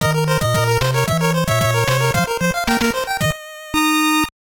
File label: Round Clear; Fantasy Zone; Tengen; NES